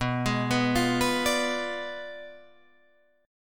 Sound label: B Diminished 7th B down Guitar Drop D